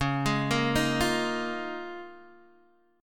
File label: Db 6th Add 9th Db down Guitar Open G